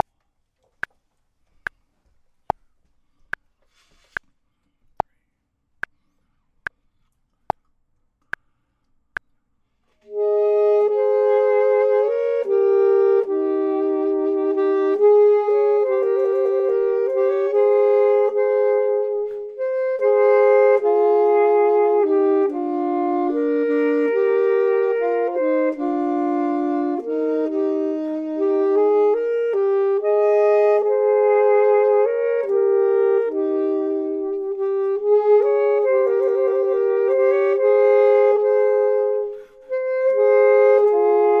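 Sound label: both, Saxophone and Clarinet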